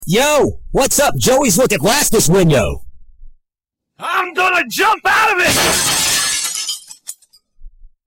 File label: guy jumps out window